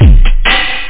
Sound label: drum loop; phat trax samples